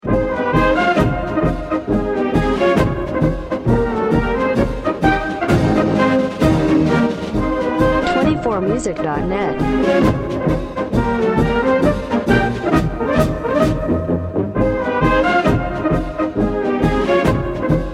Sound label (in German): olympiade marsch